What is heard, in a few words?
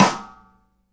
SIDE STICK, Live Snares